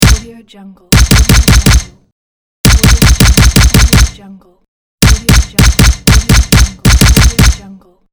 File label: gun fire